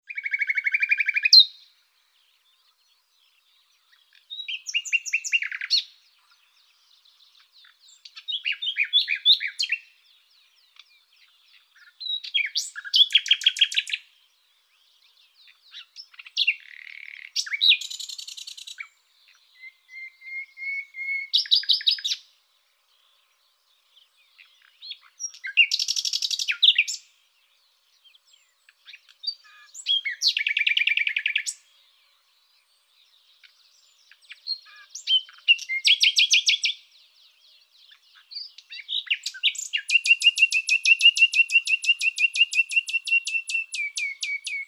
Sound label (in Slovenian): slavec Luscinia megarhynchos; TomiTrilar